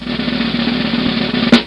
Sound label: drumroll